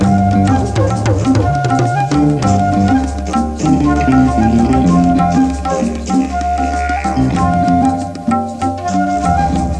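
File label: rhythm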